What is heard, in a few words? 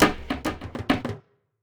LOOP